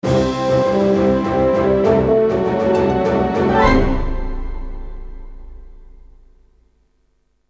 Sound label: (Jingle) Boss Defeated; Rayman 2 : The Great Escape (iOS)